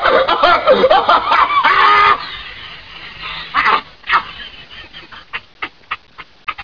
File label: weird laugh; svencoop2